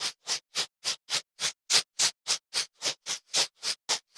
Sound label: sfx anim prop eraser